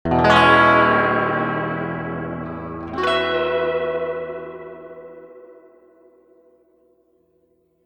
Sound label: reverb tank 2 spring short tremolo chords